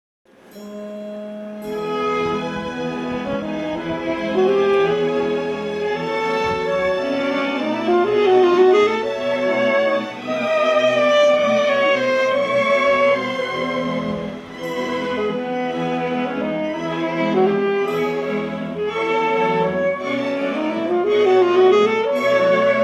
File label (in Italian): CONCERTO PER SASSOFONO E ORCHESTRA